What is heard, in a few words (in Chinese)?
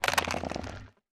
嘎枝待机声